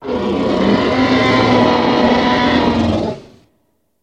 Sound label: Dinosaur Roar Call